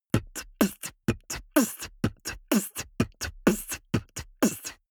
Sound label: beatbox sequence damped